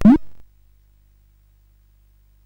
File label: sid CSG8580R5; Gremlins 2-The New Batch